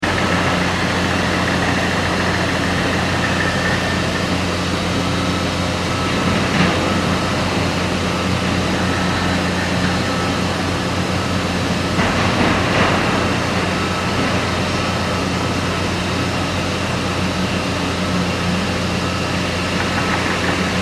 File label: ambience construction site large; Construction Site ( Large ) Ambience - Generators Close - By And Distant Construction Nois; Ground Zero Nyc; Backgrounds